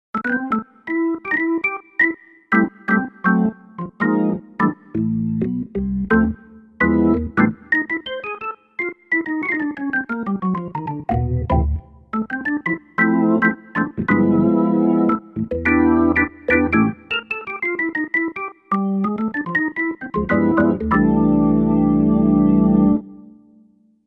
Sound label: Perc Norm 3rd, Roland SRX-07; Roland SRX-07 Ultimate Keys